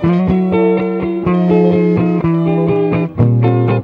EGUIT BREAKS; Best Service; World Colours

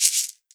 MIXED PERC; 90 s Sample CDs

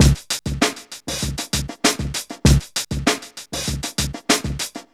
FUNKY 98, Funky Elements LOOPS; Zero-G

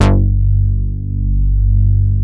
MOOG BASS G2; Best Service Dream Experience

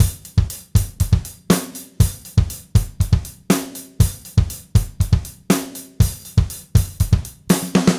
Gretsch groove 90 BPM, 1967 Gretsch Kit drums; 120 BPM; Sonic Foundry (Sony Creative Software); Vital Drums

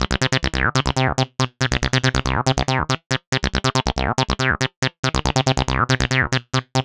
140 C bass, Trance, Bass Loops; Trance Explosion Vol1

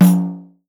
PANDE, Percussion; Voice Crystal - Steve Reid Definitive Percussion

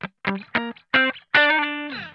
GLIDE, Funk Guitar; Zero G - Funk Guitar

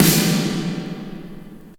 BANGS; Megabass Remix! 2

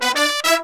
100, BRASS SAMBA; Best Service ProSamples vol.14; AKAI

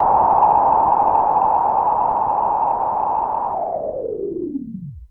Super Bass Station, Filtered Noise; noise hiss crackle

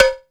COWBELL; Back In Time Records - Big Bang

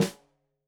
Snare Stereo, Drums; Deeply Sampled Drums

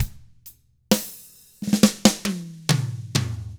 SLOW ROCK; 66SLOWROCK; Drums

